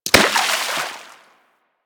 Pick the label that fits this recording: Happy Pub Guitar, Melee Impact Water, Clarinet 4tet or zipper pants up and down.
Melee Impact Water